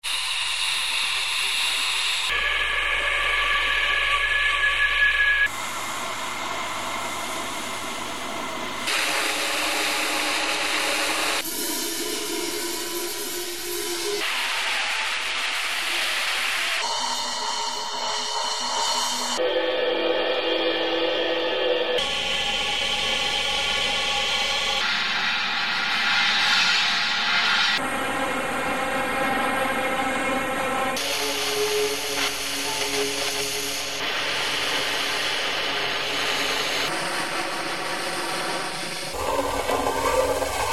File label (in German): rauschen